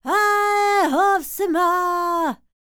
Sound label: Vocal Planet World Voices, NOMADIC